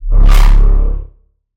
Wobble; Discombobulation; Routed Sound; Vital Expansion - Chaos Bringer Vol.1

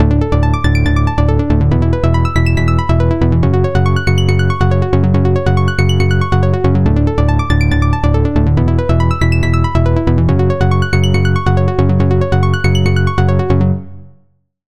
Key; Routed Sound; Sylenth1 Expansion - Hyper Gamer Vol.1

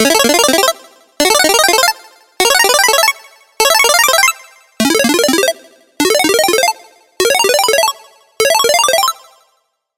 SFX - Power Up; Routed Sound; Serum 2 Expansion - Hyper Gamer Vol.1

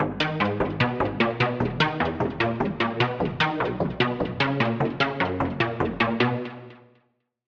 Pluck, Vital; General Relativity; Routed Sound; Vital Expansion - Star Scavenger Vol.1

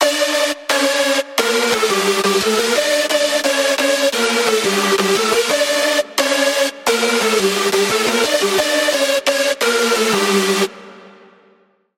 Lead; Routed Sound; Spire Expansion - Chaos Bringer Vol.1

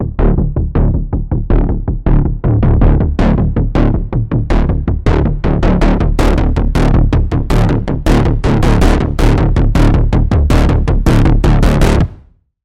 Pulse; Diabolical Impulse; Routed Sound; Vital Expansion - Quantum Enforcer Vol.1

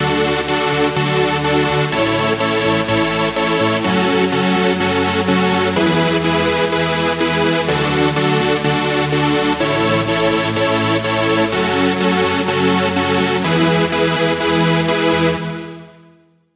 chord; Goldshire; Routed Sound; Serum 2 Expansion - Flame Summoner Vol.1

